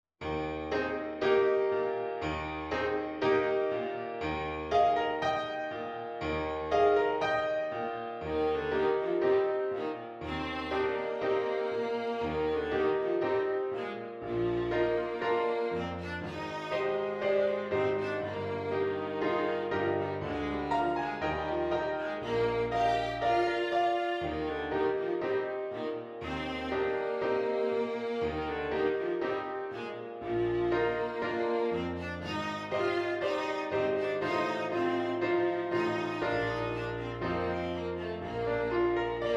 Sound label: Viola; violin